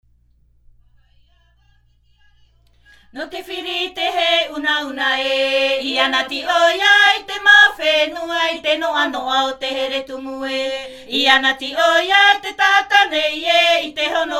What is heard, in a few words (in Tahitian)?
Himene tarava Tahiti, chants polyphoniques maohi